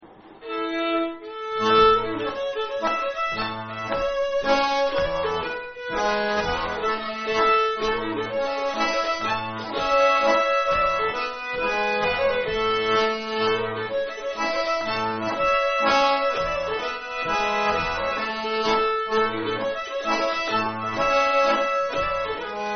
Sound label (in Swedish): polska triolpolska